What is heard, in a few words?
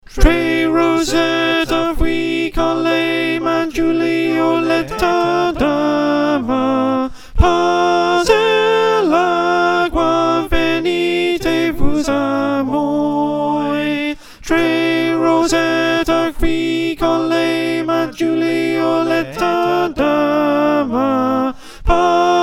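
Alto, choir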